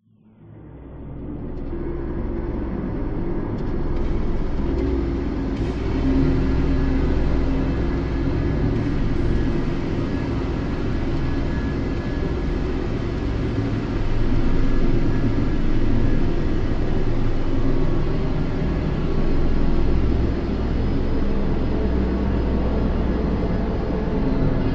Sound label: science fiction atmosphere environment dark sinister eerie busy; zapsplat